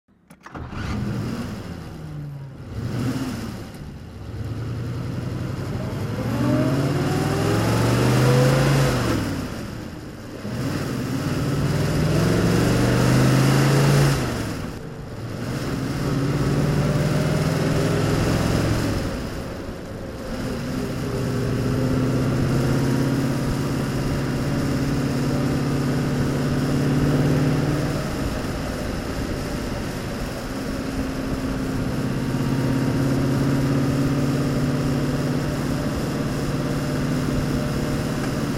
bentley 6.5 litre blower drive, Bentley 6.5 Litre Supercharged special; Bentley 6.5 Litre Blower special, 1939; V3D/ ClassicarImages